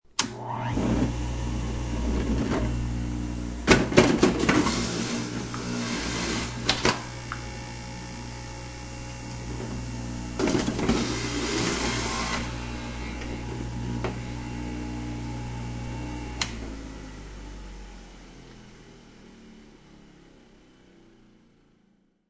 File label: Juicer, Kitchen juicer running and grinding.; Household